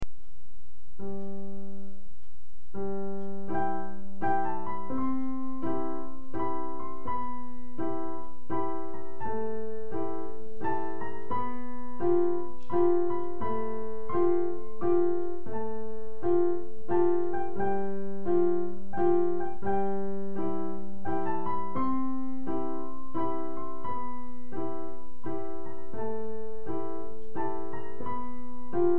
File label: Waltz